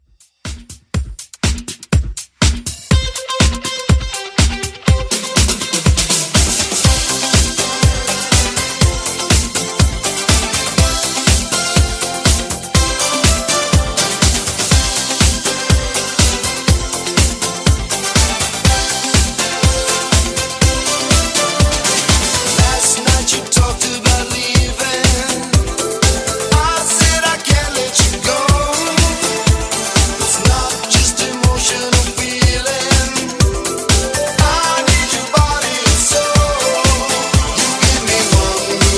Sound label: 80s Pop Extended Re Drum 122 BPM